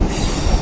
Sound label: subway sound; Subway Sound Construction Kit